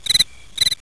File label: ambient bird; chaoscity